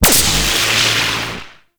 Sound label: Powerplant Tesla Coil Hit; Gameplay; Warframe; Corpus Railjack; Tether Power Plant; Ambience